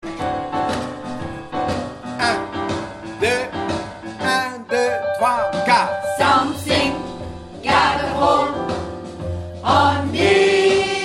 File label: alto